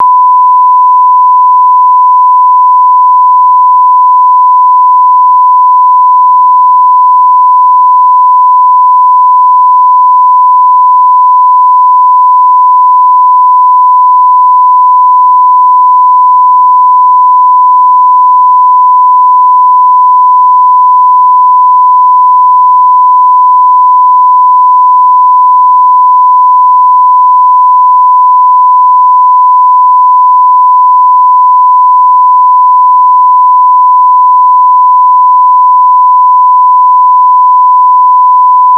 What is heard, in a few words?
Sine Wave 1khz